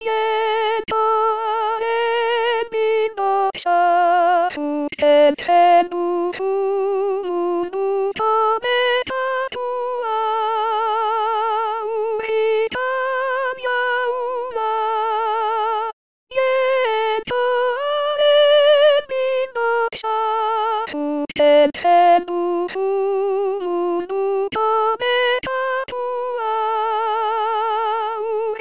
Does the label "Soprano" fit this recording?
Yes